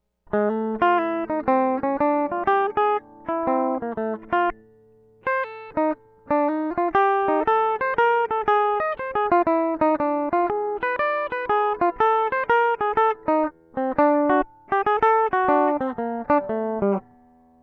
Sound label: guitar